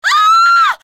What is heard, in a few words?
scream; silent hill